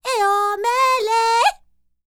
Vocal Planet World Voices, NOMADIC

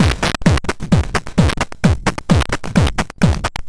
Crushed Beat 130BPM; bitcrushed; musicradar

